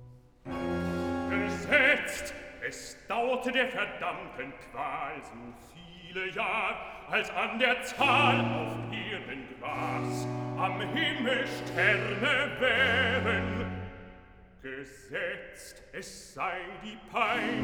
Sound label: Cantata, Recitativo (Bass); BWV 20 'O Ewigkeit, du Donnerwort'; Bach; London; 1st Sun After Trinity